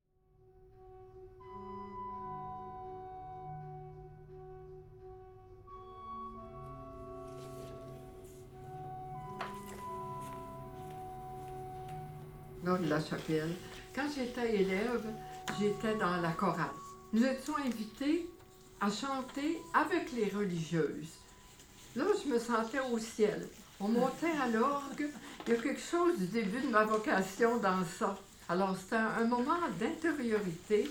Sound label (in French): choeur, chorale; Echos du choeur